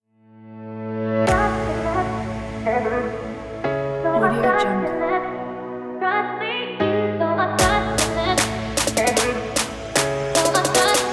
future bass; echo production

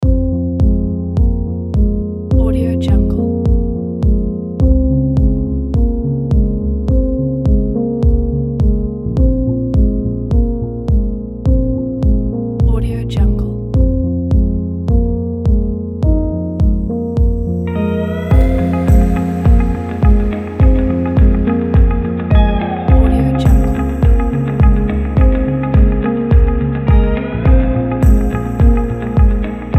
for melancholy

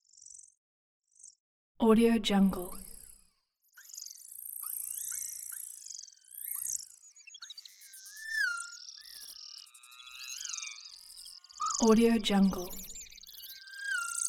sprite vocals

